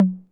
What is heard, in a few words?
Tom Hi 808 Color